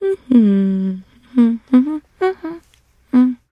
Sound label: trader hum; 商人杰恩（七日杀）